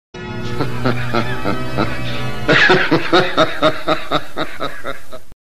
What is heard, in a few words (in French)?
rire; fantomas